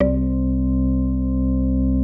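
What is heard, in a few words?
ORGN -R, organ, Drum & Bass, 145 BPM; Ueberschall; Drum & Bass Resonance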